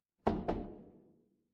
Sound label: door knock